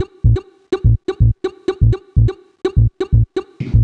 perc dumb 125, Percussion Loops, Classic Chicago House